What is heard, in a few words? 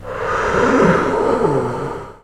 DIE; mahlendur